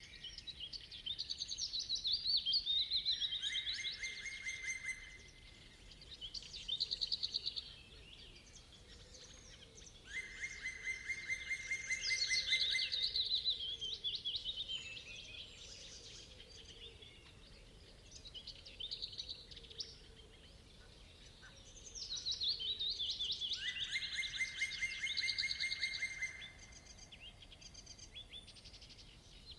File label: forest summer sunrise near lake light wind birds great tit swan woodpecker; Sonniss GDC